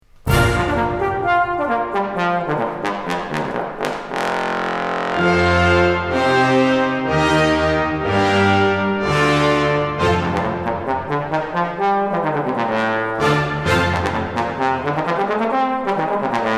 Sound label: Bass trombone concerto